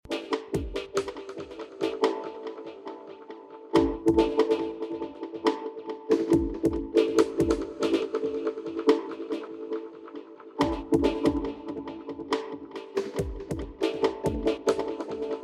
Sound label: ambient drone; noisesurfer